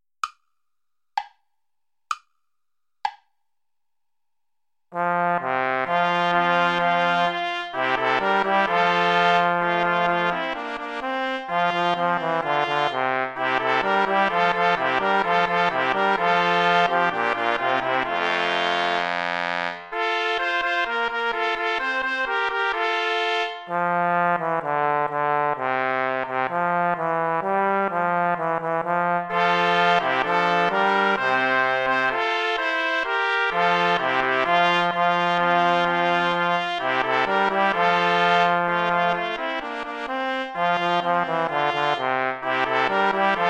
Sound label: play along without trumpet; praetorius michael psallite unigenito